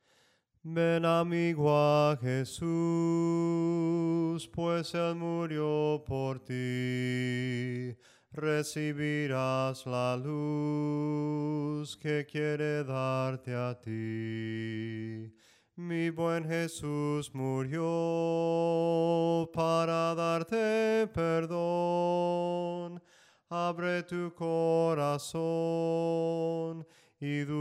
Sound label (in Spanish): Ven amigo a Jesus melodia palabras